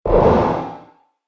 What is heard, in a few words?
Synthetic Crash Sound, impact